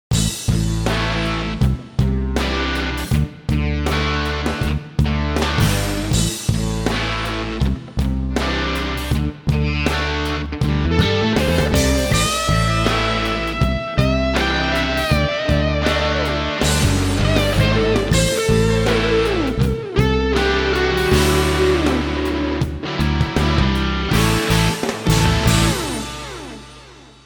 thors groove; Both guitar parts; recorded by Martin Lister, a.k.a. MartyMart

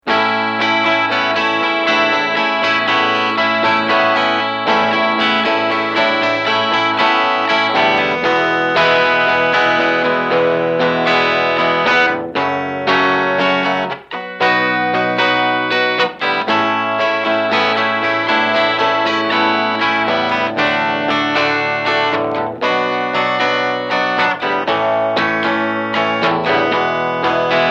matchbox direct

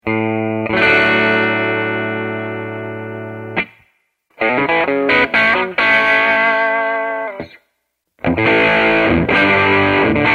matchbox amp; HB equipped guitar - Thunderchief - Cab Sim - software reverb

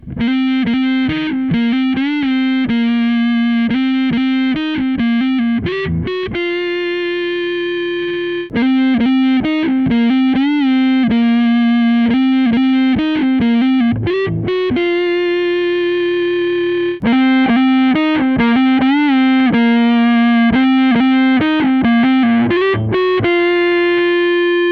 lead neck; settings: Gain 50%, Tone 50%; mode is set to Scoop, then Classic

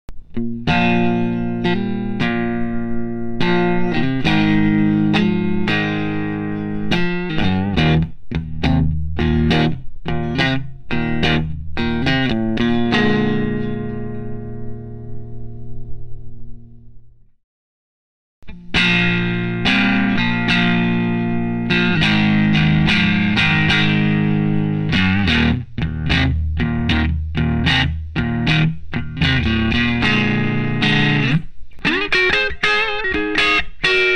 azabache, Tone 10, Gain 12, Bright Off, Scoop On

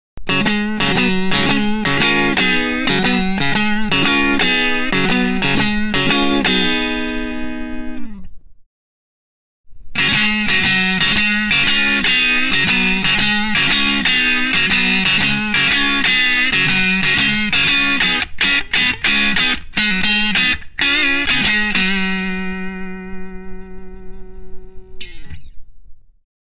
azabache, Tone 9, Gain 13, Bright On, Scoop On

